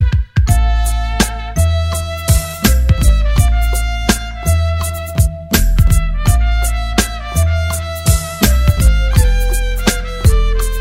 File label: mother beat